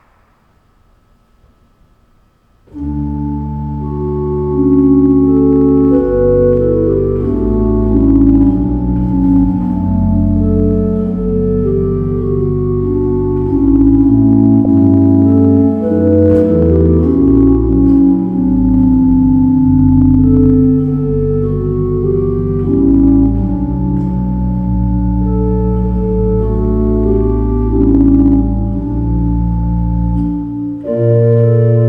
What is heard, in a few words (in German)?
Bourdon 8, Kirche Orgel; ref. Kirche Thalwil; Haas-Orgel